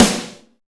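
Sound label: ANGRY snare, drums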